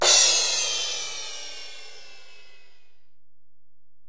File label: Ride, Alesis DM-PRO, Drum Machines